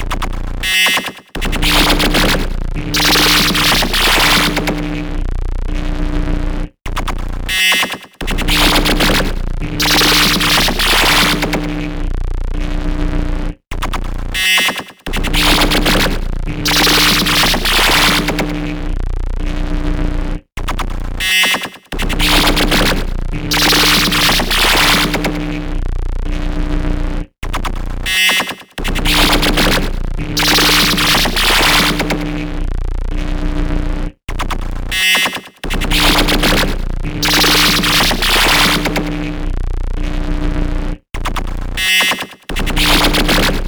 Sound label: corporate medical test blood